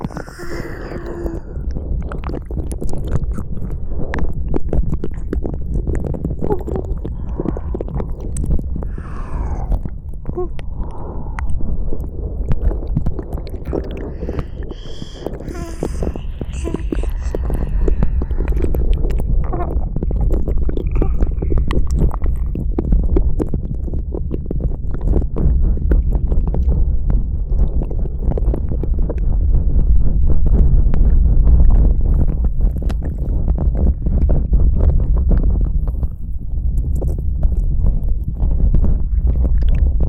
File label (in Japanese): 両耳舐めオンリートラック